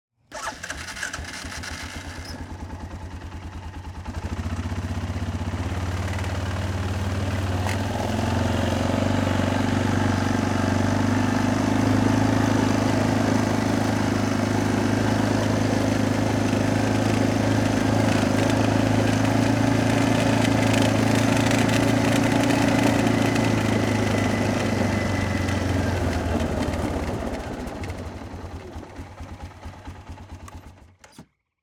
Various Driving With Engine Start And Engine Shutoff, ATV Arctic Cat 650 H1; Stereo; DavidDumais